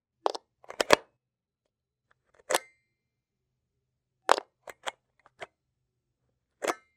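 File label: socket making sounds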